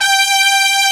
Section Brass G4; Proteus 2000; Digital Sound Factory Proteus 2000

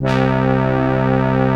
SIEL, String Machines; Best Service; XX Large Most Wanted 2; SIEL-CRUI

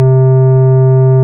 ORG, Farfisa

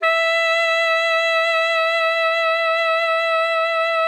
E5, alto sax; Xsample VOL-2

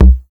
base drum; DRUM LIBRARY; DRUMS

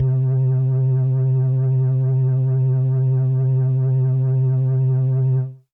Pad C, Synth Prophet One Shots; Electronisounds

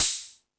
High Hat, Drum Machines E-MU Pro-Cussion

